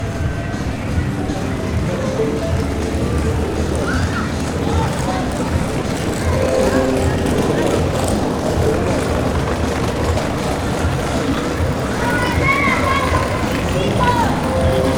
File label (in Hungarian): jatszo gyerekek